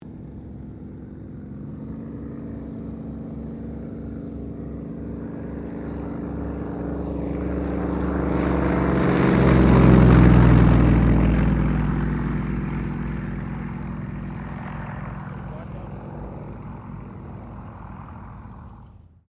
AIRCRAFT YAKOVLEV YAK-52 TAKEOFF, Russian Yak 52 Training aircraft; Airplanes; Vehicles